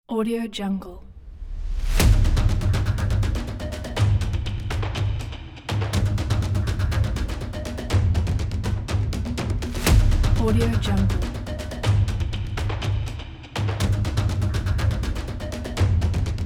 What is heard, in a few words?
an epic drums; andromedasound